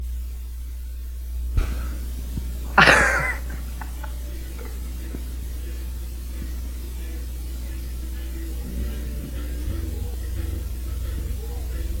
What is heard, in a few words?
laugh track; Laugh is pretty low in volume; You can hear the track go into record mode